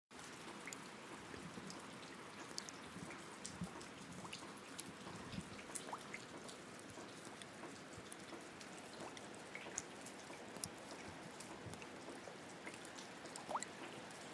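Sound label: bubbling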